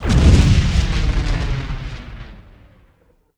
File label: impact, Bridge Impacts; sfx